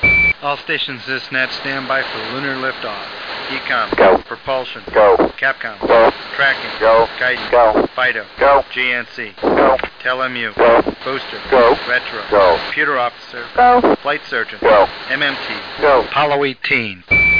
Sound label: LIFTOFF